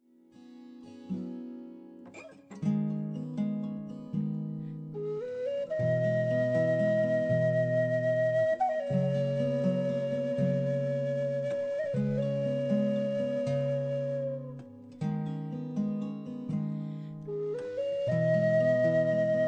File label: Valley Song